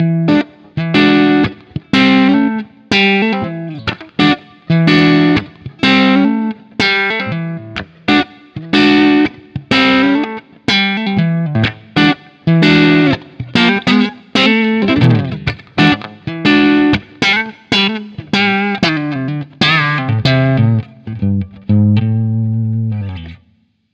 Custom 57 Breakup 4x12 Vintage Basketwave; Scuffham Amps S-Gear

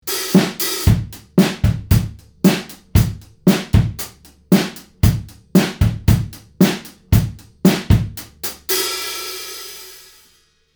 Drum Room; WA76; ARFast; MK20

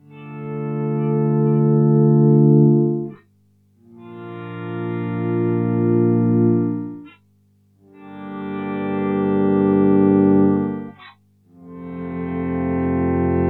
EHX Pico Attack Decay Poly Attack 15h

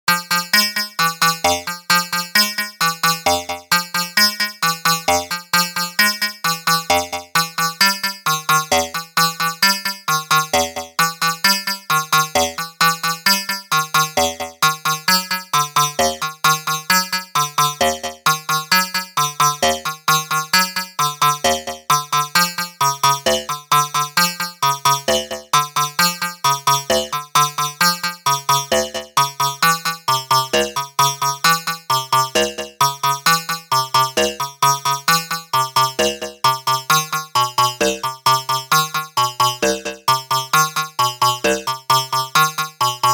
EDM Festival Stuff

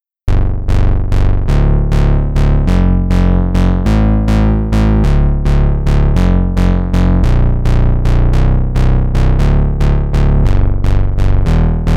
Pulse2 Preset

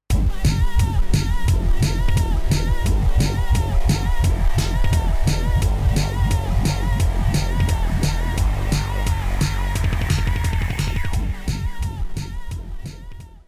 Electribe SX Drum Bass

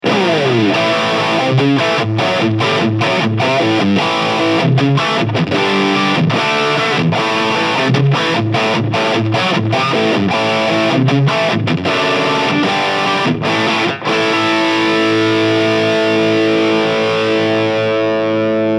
Cranberry Overdrive JCM 800 High Input Boost; One Control

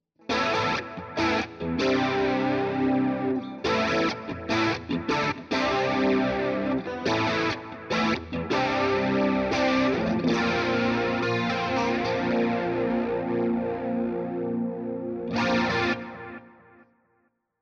Dreamy Rhythm

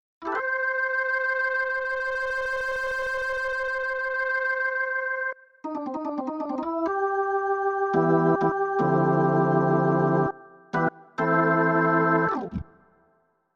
Roland RD-800 Rock Organ

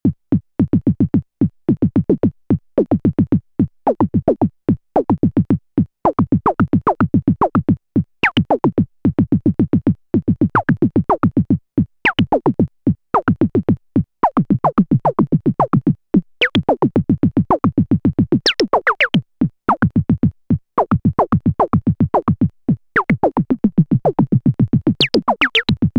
Analogue Solutions Megacity Fill In Mode

